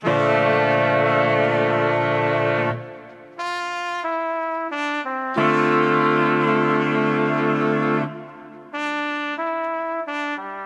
Classic Melody Loop - 90 BPM D Min Horns; Melodics; Loops; Loop Stems; Cymatics; Eternity

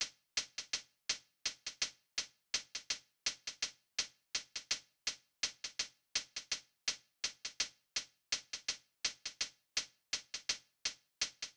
Manual Drum Loop 83 BPM Hihat, Lofi; Cymatics; ANALOG EVOLUTION Beta

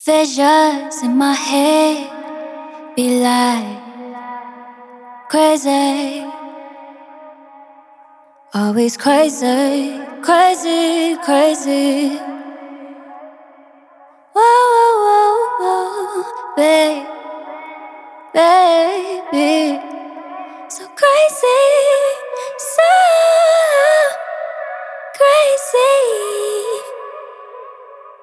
Vocal Phrase Visions - 135 BPM G# Min Wet; Vocals; Cymatics; ZODIAC Beta Pack

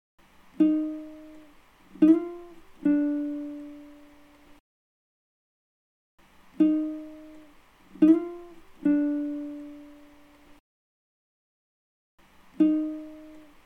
80 BPM G Min Guitar; Loop Stems; Cymatics; Harmony

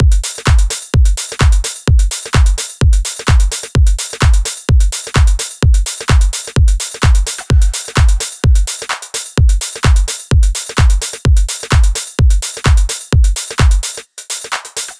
Drum Loop - 128 BPM, house full drum loops; Cymatics

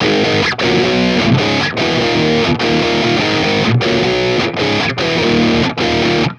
Metal Guitar Loop, 150 BPM G# Maj; Cymatics; Vibrations Guitar Sample Pack

